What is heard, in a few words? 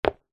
foley footstep single soccer boot on tiled floor